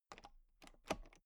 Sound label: toolbox latch open loose clatter; industrie wirtschaft; handwerk